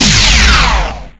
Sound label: Junk Torp